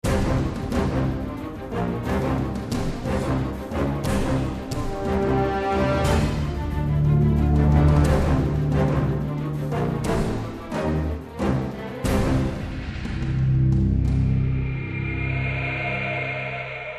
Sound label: Action